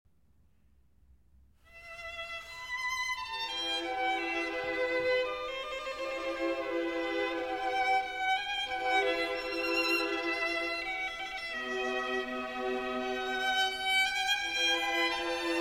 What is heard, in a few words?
Violin Concerto In E; Vivaldi Violin Concerto In E, RV 271 L'amoroso - 2. Cant